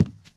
cardboard, footsteps walk; Left 4 Dead 2; boomer

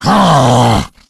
tank fail, voice, growl; Left 4 Dead 2

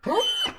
dog yelping; Hearts of Iron IV Death or Dishonor

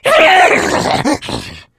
jockey death, voice; Left 4 Dead 2

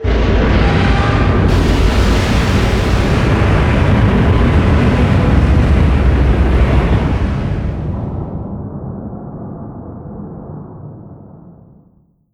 distance super heavy battleship destroyed; Hearts of Iron IV Death or Dishonor

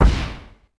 launch grenade; Sid Meier's Civilization 4 Complete; Warlords; Units

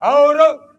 give orders disband; Hearts of Iron IV Death or Dishonor

